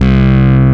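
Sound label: analog clav C2, analog synth; SAMPLE WAVE MIXING 1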